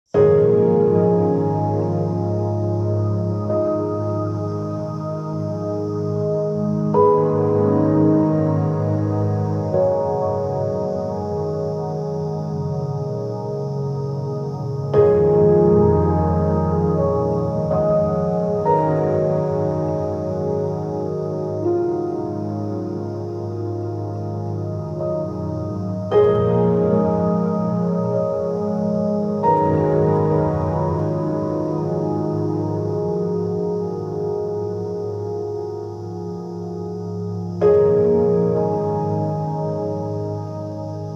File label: calm cinematic background